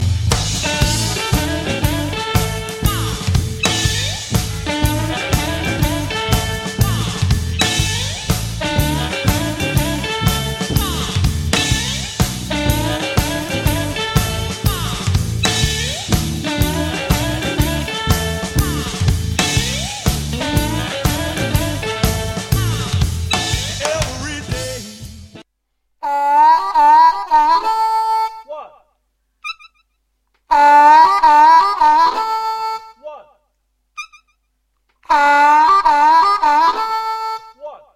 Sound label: second riff